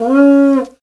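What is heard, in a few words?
Afro Horn Phrase; Roland LCDP11 Africa VOL-1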